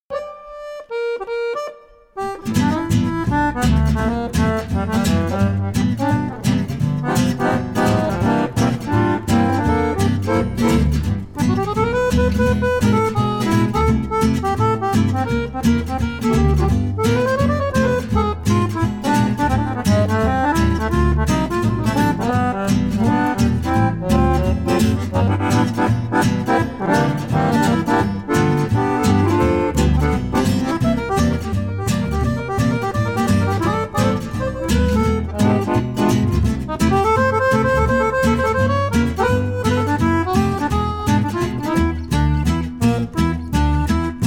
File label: Swing